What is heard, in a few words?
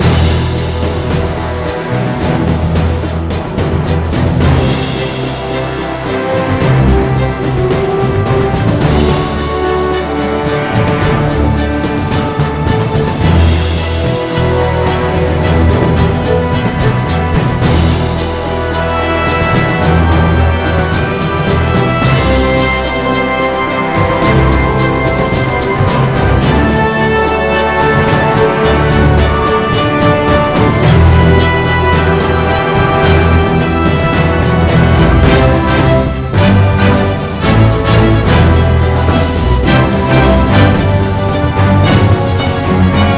Inspiratinal Orchestral; Production Music